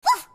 woof; pochita

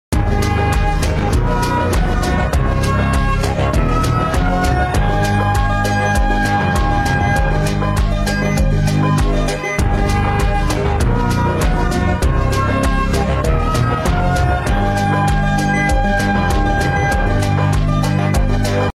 win sound; brawl stars

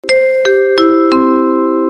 end of announcement

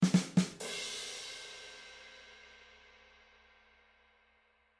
joke drums

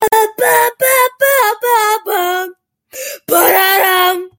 sussy scream

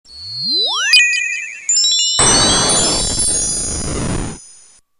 ice barrage

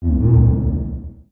eerie, slow

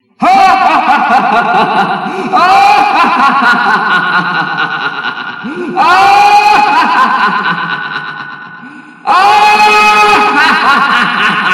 longest evil laughter

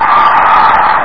aaaaaaaaaa

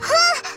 hmpf

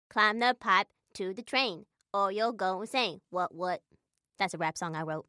rap song

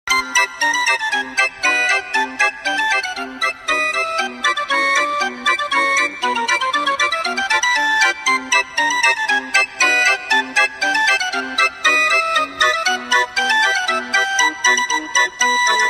circus

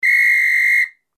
whistle

